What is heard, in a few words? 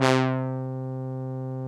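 Juno brass, analog synths; 90s Sample CDs; Club-50 - Foundations Roland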